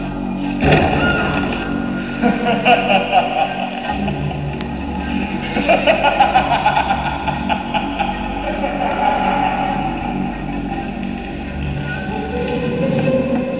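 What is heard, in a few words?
crow laugh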